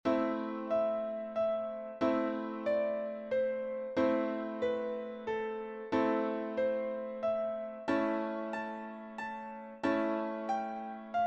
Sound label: piano